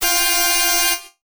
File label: Buzzer; Ball Jacks; Genesis